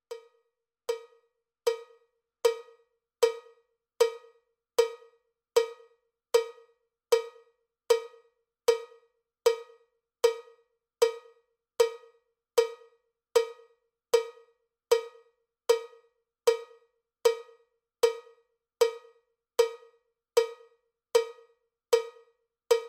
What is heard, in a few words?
cowbell click track bpm 79; cowbell click track bpm 77